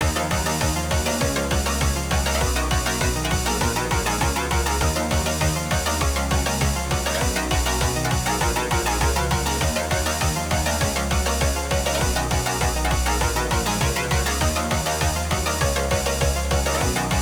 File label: (Instrumental); Friday Night Funkin Literally Every FNF Mod Ever; Vs. Bob Week; RUN